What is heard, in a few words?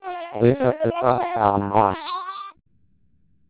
twelp 300 dam siren